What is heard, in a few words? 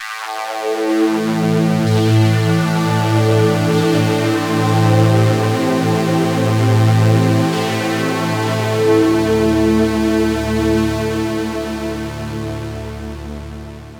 hipass pad; novation peak test